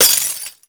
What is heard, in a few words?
smash; garrysmod; perks